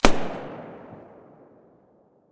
silencer sv98 mono distant

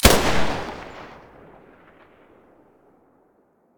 muzzle vector stereo, weapons

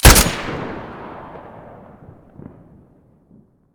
svu muzzle stereo

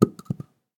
Shell 12ga carpet; STALKER; IWP

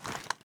aim in, weapons; STALKER; IWP

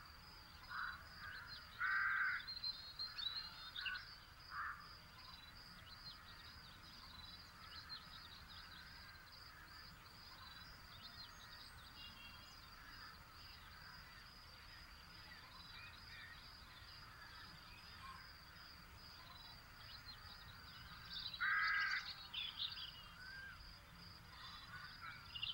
bkg evening, ambient background; STALKER; IWP

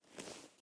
generic close, weapons; STALKER; IWP

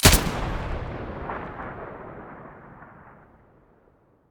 aug muzzle stereo, weapons; STALKER; IWP

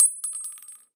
shell 39mm default; STALKER; IWP

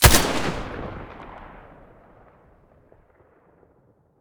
scar muzzle mono

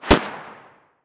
glock17 muzzle mono far

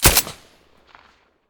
m9a3 silencer mono; beretta

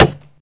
tree, bullet collide; STALKER; IWP

